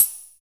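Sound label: tambo open hi-hat; Drums In Your FACE!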